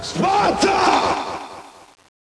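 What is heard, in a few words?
machine gun burst, ambience; svencoop; cracklife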